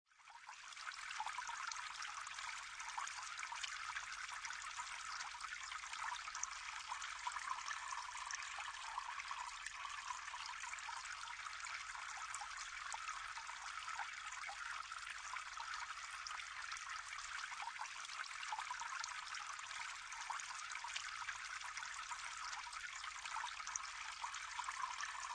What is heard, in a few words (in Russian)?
potok vodi ruchej vodopad vodopad kran protekaet